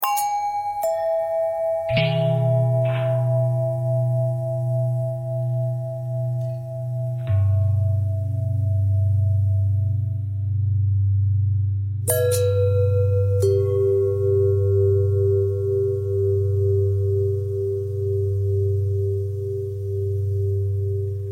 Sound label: long bell long bell thread pentatonic, bells